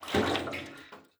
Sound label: contents, metal barrel; physics; props